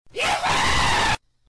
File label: Scream